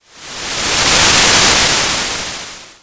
white noise flash

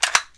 rotate; ar1

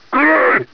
throw; hunter

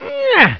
barnacle chew; supermariobros; luigi

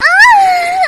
ahh

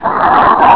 pain

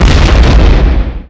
explode

